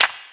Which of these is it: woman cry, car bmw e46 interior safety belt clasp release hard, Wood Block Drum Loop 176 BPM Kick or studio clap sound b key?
studio clap sound b key